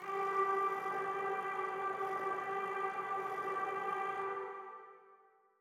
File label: Blowfish G